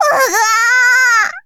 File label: combo break